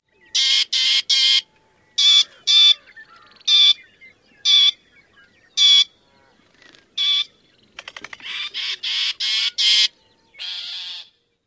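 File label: calls, loggerhead shrike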